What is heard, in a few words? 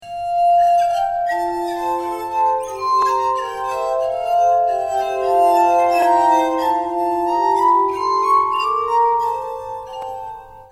glass armonica; Rondo for glass armonica by Joseph Aloys Schmittbauer (1718-1809)